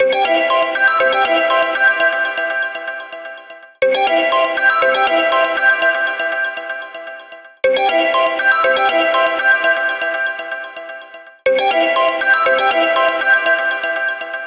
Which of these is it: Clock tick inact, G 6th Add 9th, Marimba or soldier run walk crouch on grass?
Marimba